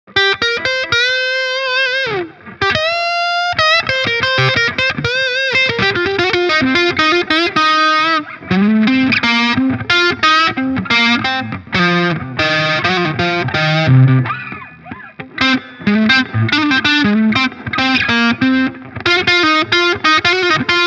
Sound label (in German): Epiphone Firebird verzerrt Hals